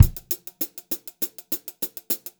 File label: VAR, Funk-Groove Drumloops; USB Soundscan vol.38; AKAI